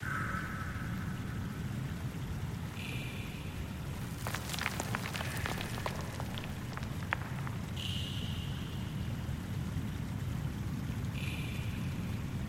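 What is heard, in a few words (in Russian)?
устрашающий шуршащий звук текущей воды в заброшенном подвальном помещении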